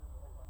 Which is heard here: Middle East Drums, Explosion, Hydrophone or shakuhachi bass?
Hydrophone